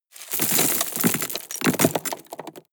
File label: drop rock debris hard